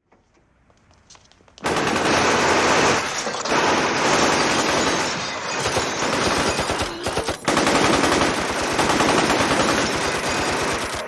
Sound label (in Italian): suono raffica; I proiettili esplosi furono talmente tanti che il cottage sembrò vacillare.